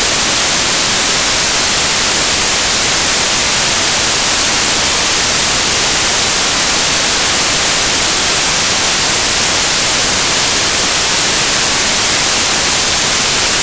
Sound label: wakeup